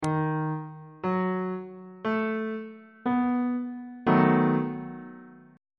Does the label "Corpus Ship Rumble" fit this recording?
No